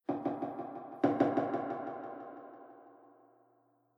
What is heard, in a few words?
Cave Impact Soft Thuds With Fast Delay; Impact / Explosion; Andrew Fleming